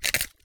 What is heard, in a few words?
attack